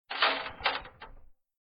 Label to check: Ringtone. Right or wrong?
Wrong